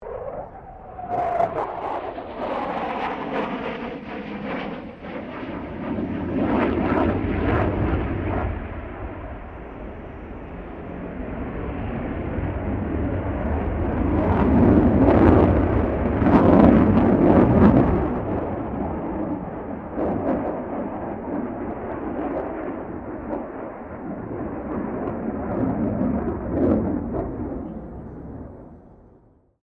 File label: F16 Falcon pass distant, stereo; Airplanes; Vehicles